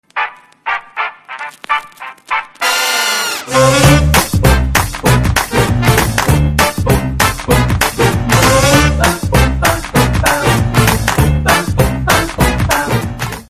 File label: Instrumental Ringtone; Devadas; Telugu